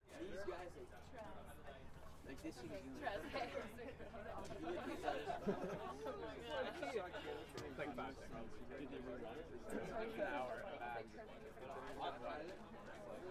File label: Crowds